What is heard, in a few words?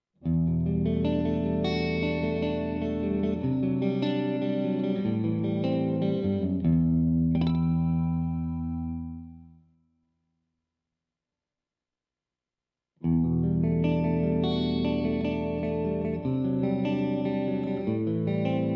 Clean Split Humbucker; panico pickup hb1 humbucker